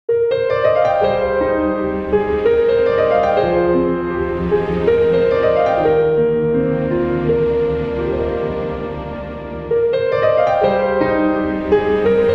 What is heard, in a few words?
Piano Eb; 3/4; Piano 5